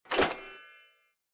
multiplier Up; cashomatic

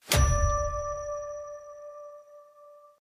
transform Blink; finn

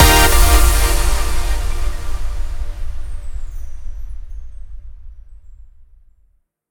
big Win End; jewel fruits

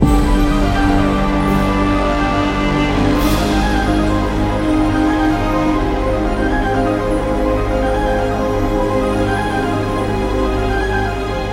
big win music; godsofgold

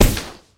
substitution Symbol Burst; shangrila

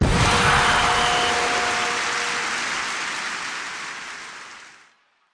small win; football

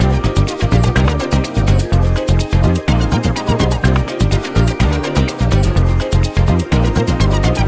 music loop main intense; disco danny

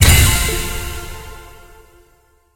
scatter Hit; football

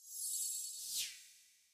firefly; fireflies

